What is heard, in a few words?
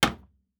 Wood Impact; Fantasy Sounds Bundle; Craft